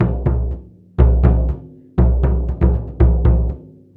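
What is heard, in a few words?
120 bpm, ethno drums, low drums; Spectrasonics - Supreme Beats - World Dance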